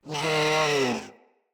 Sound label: Vocal Death; Warframes; Khora